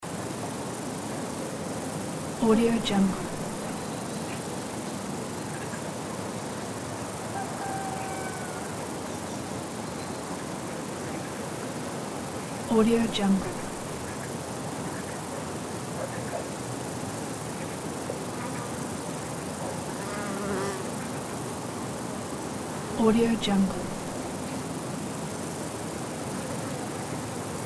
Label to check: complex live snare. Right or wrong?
Wrong